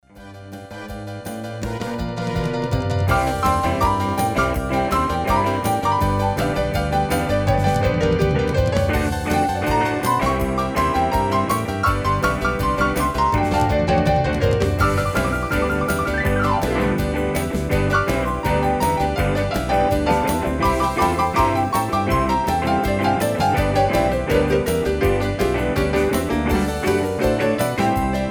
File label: Keyboards